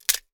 Wood snap

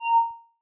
Professional Logo

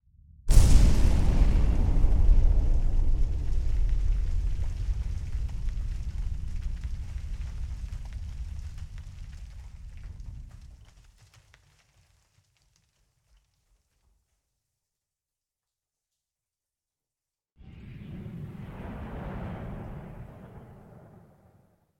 Blast Mining

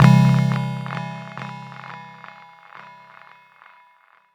Chord

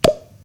Flopp Bass

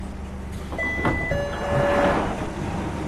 Doors opening

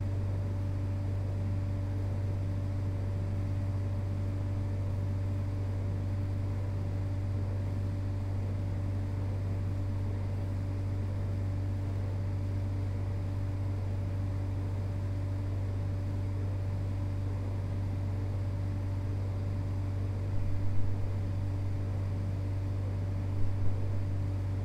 Basement Ambience Plant Room; Hilton

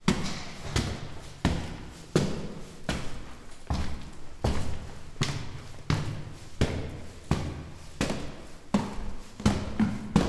Stair climbing

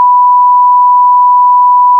Censor Beep Loopable

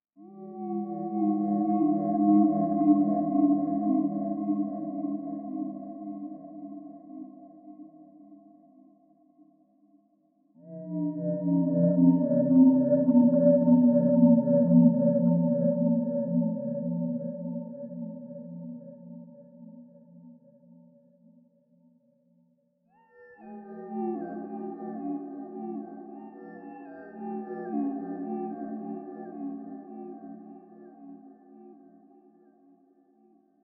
Jungle

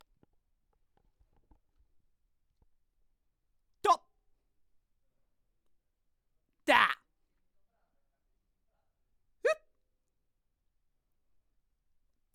Frustration Vocal Samples

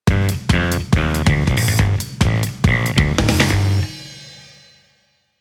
Drum Bass

